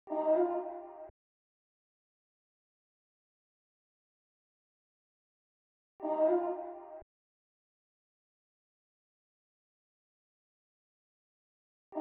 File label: 81 BPM A# Min Vocal Chop; Cymatics; Destiny Gold Expansion; Orchid